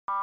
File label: Alarm Beep Med Pitch